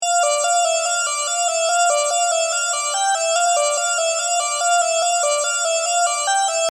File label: Lead